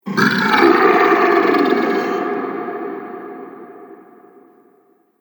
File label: An Angry Ogre, monster; Monster Sound Effects; Fantasy Sound Effect; Andrew Fleming